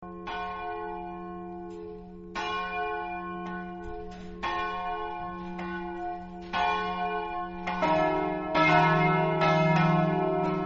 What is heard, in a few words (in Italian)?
s floriano, campane